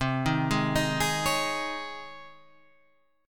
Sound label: Db Major 7th Suspended 2nd C